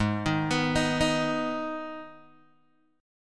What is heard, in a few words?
G sharp Minor, Guitar, Open G; x,1,1,4,4,1